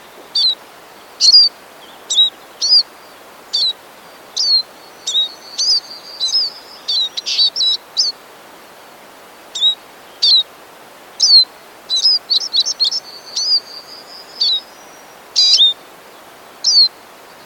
tarina, Lugano, Spinus spinus, Canto; Observatorio de aves

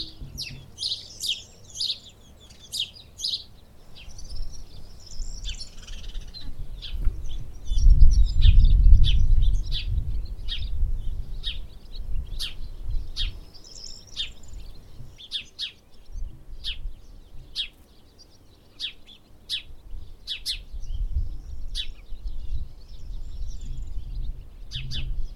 etxetxoria, Gorrión común, Passer domesticus, Canto; Observatorio de aves

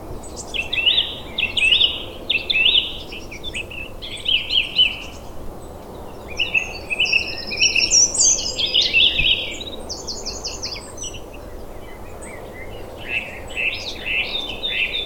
birigarroa, Zorzal común, Turdus philomelos, Canto; Observatorio de aves

